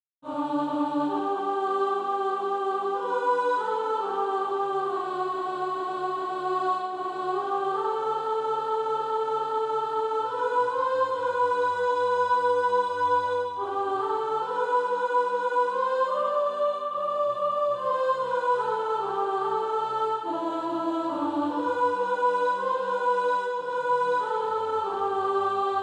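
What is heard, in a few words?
soprano, Practise Music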